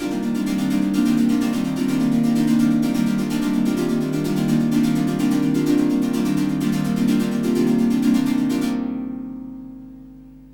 127, SYNTH SEQ; AMG; Global Trance Mission VOL-2

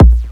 bassdrums, techno; Techno ID; single-drums

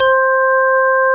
ORGAN; Best Service; Dance Mega Synth; 90 s Sample CDs

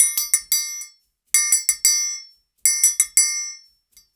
90, Percussion Loops

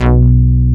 Xpander bass, OB Xpander; Greytsounds VOL-1

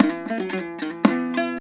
HALAM MELODY; Best Service; World Colours

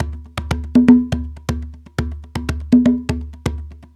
TONGA; Spectrasonics; Supreme Beats; African Contemporary

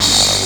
SPRING; PERCUSSIVE; Rarefaction; Poke in The Ear With A Sharp Stick

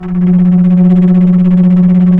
bass flute flutter; Roland L-CDX-03 Disk 1; Bs Flutter

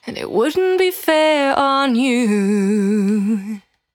Cry 68bpm B, Vocal; Zero-G

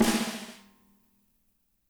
MED SNR; ROCKER KIT

